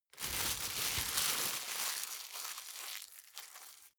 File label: plastic bag rumble